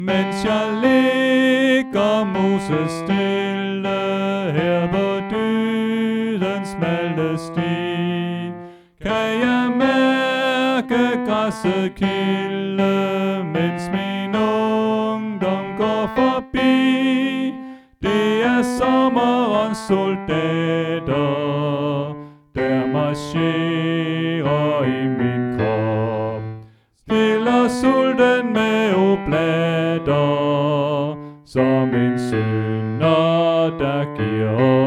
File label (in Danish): Tenor; oevefiler